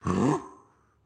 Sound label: Dash Attack; Going Under; Caffiend Caelan